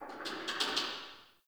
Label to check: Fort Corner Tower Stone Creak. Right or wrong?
Right